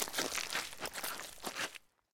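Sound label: unpleasant nasty sliding sound of mud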